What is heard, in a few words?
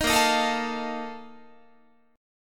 A sharp Major 7th Suspended 4th, Guitar; strummed